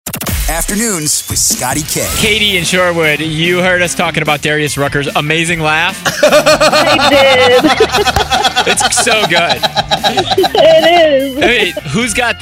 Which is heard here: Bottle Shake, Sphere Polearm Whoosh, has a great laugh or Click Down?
has a great laugh